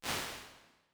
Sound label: Small Hall, Lexicon 480L Reverb; impulse responses; Big Gee's